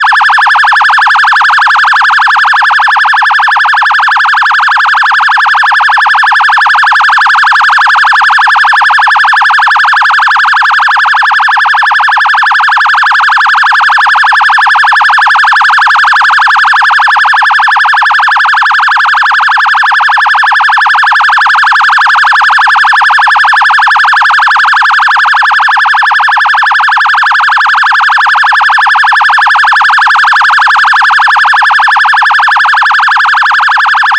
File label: electronic phaser siren